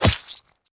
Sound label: shadow kunai hit